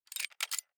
开锁声

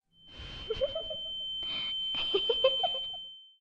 耳鸣加笑声; 谢幕演出; 第一章

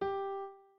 院长房间 钢琴; 序章

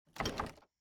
门锁互动

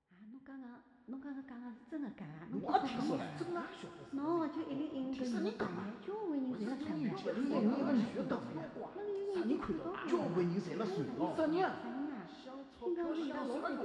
议论声

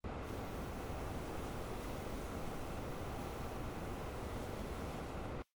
房间内部场景

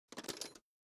八音盒互动

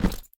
sfx 检阅道具

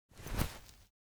检查血迹

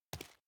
硬地面, 脚步

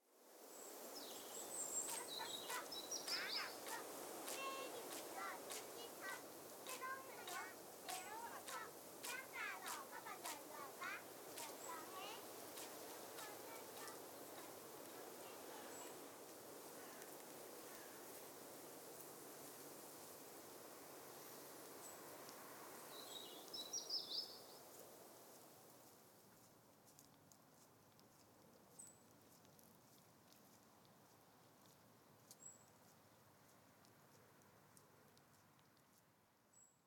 孤儿院 儿童民谣背景音

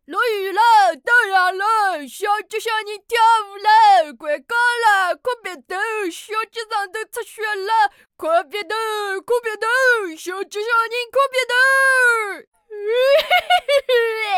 纸杯电话小男孩童谣

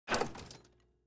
八音盒打开

